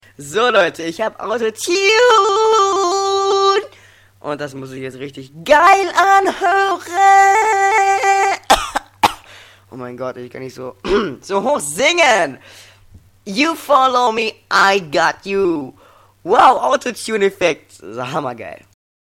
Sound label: auto tune